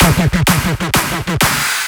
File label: filler tool 128 E; Electro; filler tools; Anthemic